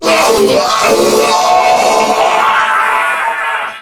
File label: Death; Warframe; Corpus Crewmen; Crewman C; Dialog Barks Battle